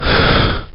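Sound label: SFX phantom pain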